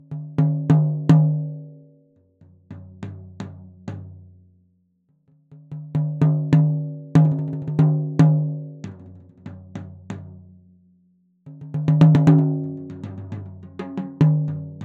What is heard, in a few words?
md 421 kompakt jazz drums tom; mikrofonu test